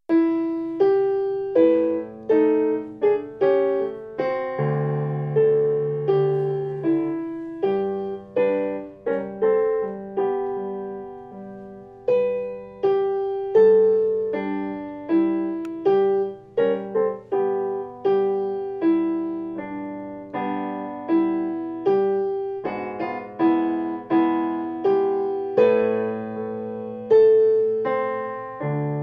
spiritual playback lent

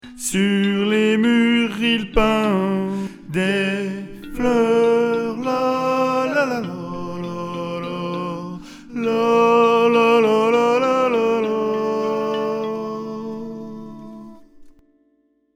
berlin ouest coda voix 2 grave

